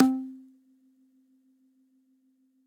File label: Hair Clip, Fleamarket Percussion; Ableton; Lost and Found